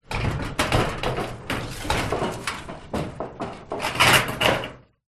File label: impact metal loose corrugated gate wobble hard movements